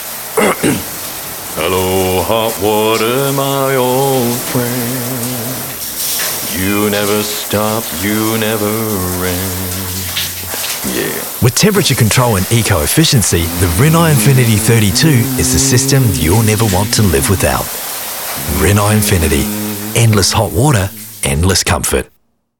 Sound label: Singing, en-AU; Rinnai